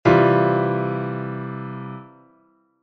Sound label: melody chord